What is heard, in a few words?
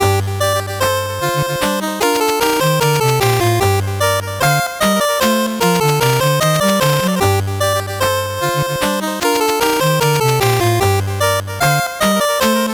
8bit bgm normal